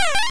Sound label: Return Single; Pokemon; Gen 2; attack moves; GSC